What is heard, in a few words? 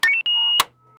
connecting hand radio to, handheld